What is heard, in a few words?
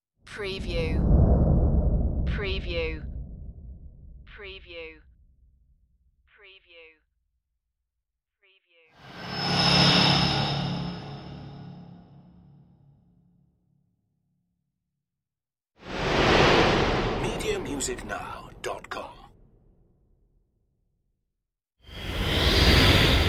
space whooshes